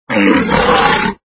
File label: walrus; inlogic-arcticpong